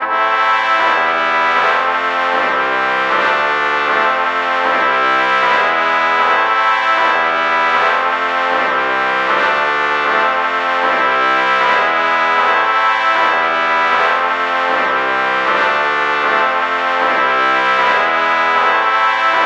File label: trumpet trumpet lpc true chord morph